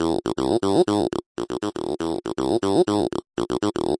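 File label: filtery tb303 line, 120 bpm; SYNTH AND ELECTRONIC LOOPS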